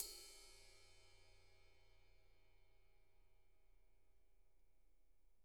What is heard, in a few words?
RIDE, Drum Kits